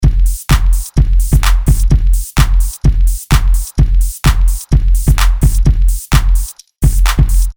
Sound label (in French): drum trousseau de cles; Loop Mania Sampler Pack